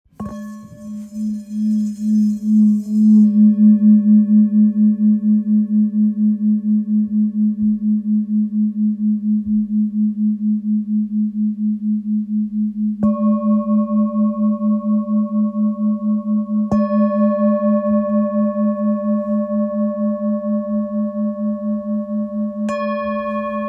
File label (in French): Bol ancien sol